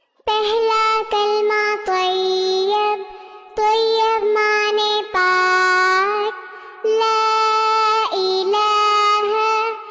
naat sharif kalma pak islamic ringtone; pehla kalma tayyab; cute cat

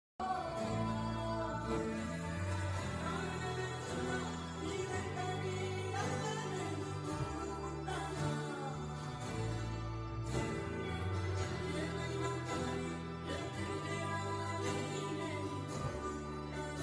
climax bgm ringtone; jigra movie; alia bhatt

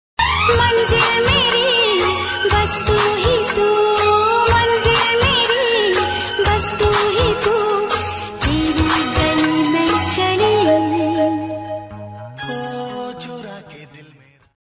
love sad song ringtone hindi ringtone

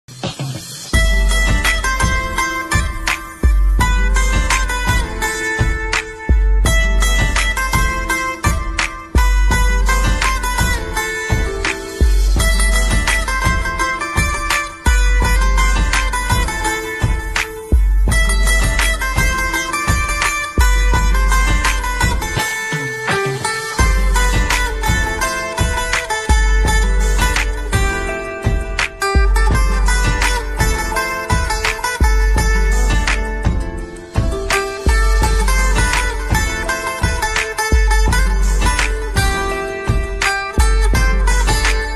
instrumental ringtone

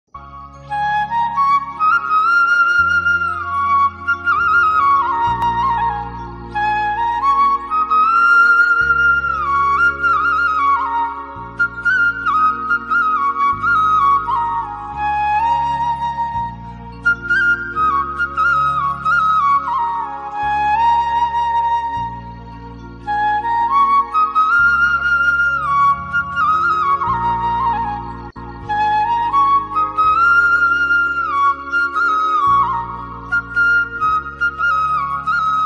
pahadi flute tune music; beautiful himalayas

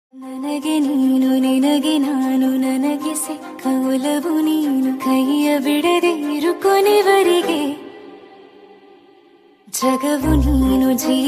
kannada song; upadyaksha

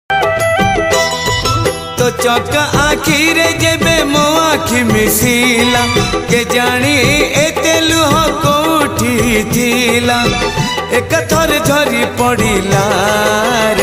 odia bhajan ringtone song; jagannath

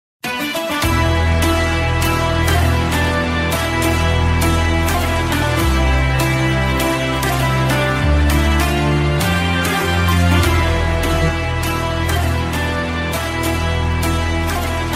climax drums bgm climax bgm ringtone dark; kgf

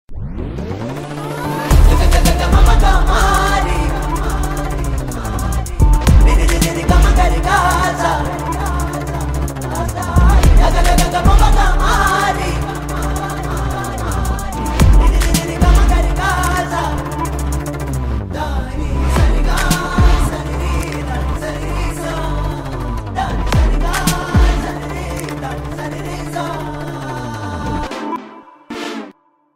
love bgm; thallumala; tovino thomas; kalyani priyadarshan

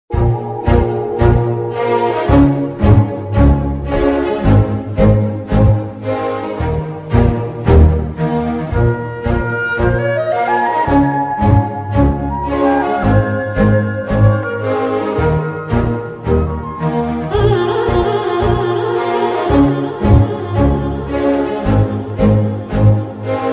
bgm ringtone background music; pooja hegde

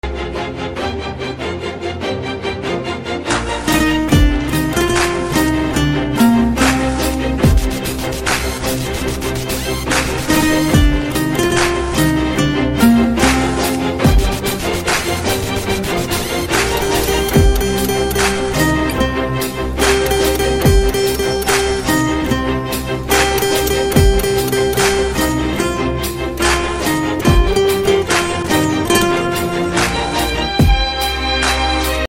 bgm guitar cover guitar solo; tamil bgm solo; kaaki sattai

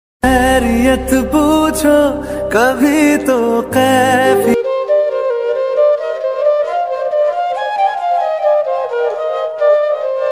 song ringtone; chhichhore movie

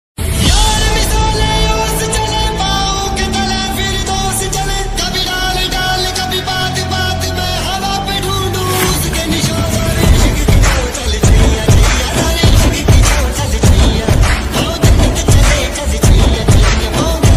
lofi ringtone slowed reverb ringtones bgm notes

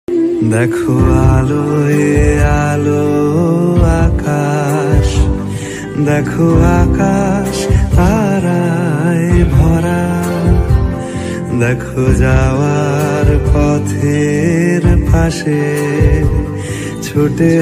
hindi ringtone

